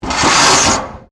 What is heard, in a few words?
METAL BIN SCRAPE CONCRETE; Materials